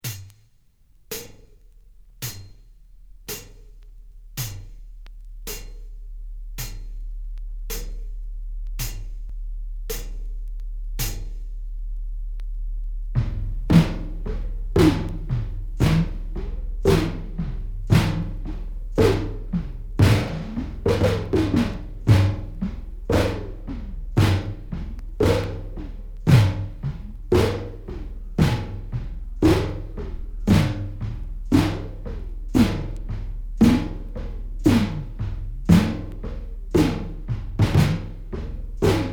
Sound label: dramatic rhythm